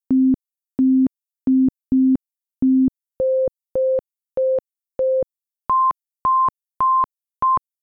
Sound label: click